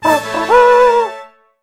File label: card win